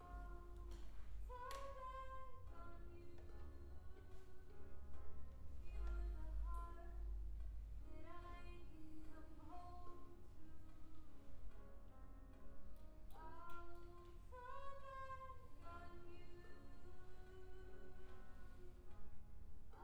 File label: Cello